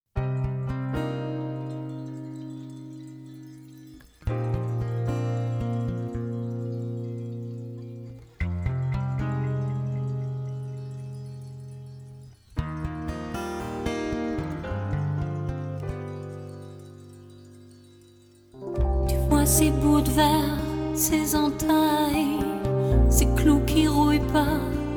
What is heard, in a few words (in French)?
chant